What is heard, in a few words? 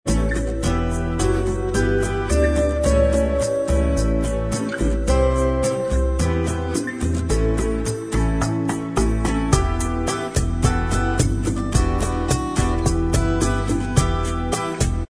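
bossa style instrumental, backing track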